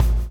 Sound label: BLOW; Spectrasonics - Backbeat